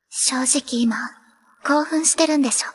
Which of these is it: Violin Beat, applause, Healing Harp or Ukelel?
applause